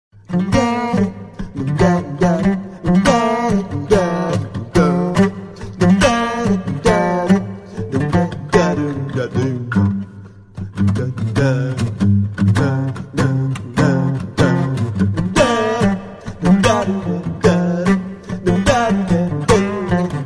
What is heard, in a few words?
Naked Blues